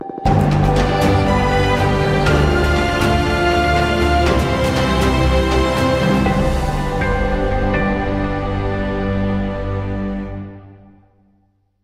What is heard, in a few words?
Al Jazeera English News Sting With Hold